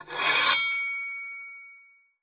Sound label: Execut Threat Sword